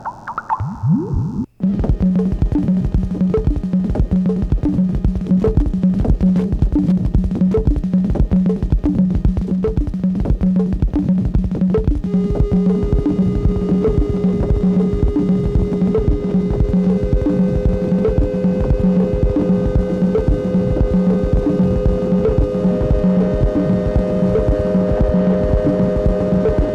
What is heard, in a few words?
SLUDGE BEAT